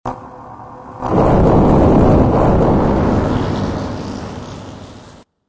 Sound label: start engine encendido motor